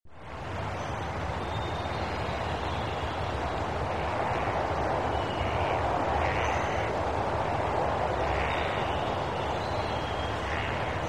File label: Ambient; Monster Hunter